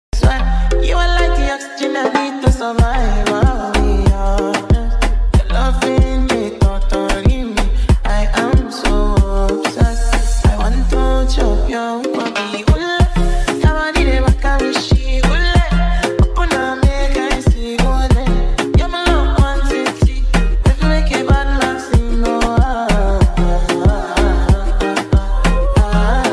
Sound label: ringtone remix